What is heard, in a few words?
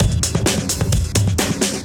Beat Style, 130bpm, breaks